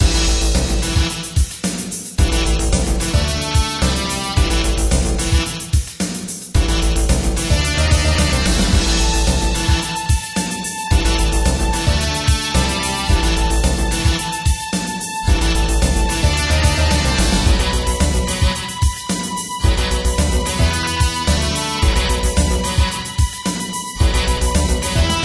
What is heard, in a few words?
Dungeon; Adventure Bar Story; Android game music